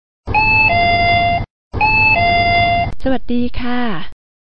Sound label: 7 11 sound thailand